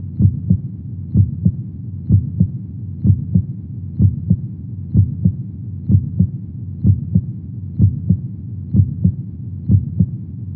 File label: HEARTBEAT